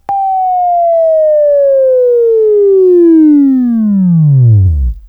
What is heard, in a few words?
Buzz; Labview-Program; Sweeping Training program